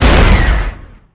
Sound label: Rocket Launcher Shot; Weapons